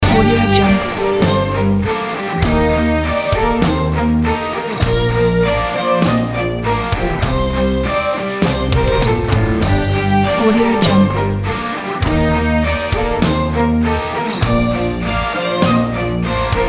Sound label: fiddle rock loop